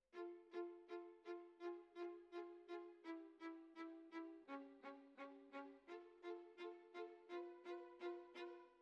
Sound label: Violas, jazz for piano